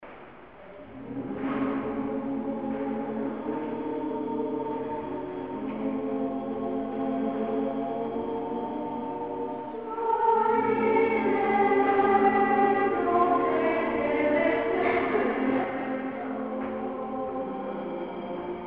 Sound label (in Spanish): Cantar de nenos, Coral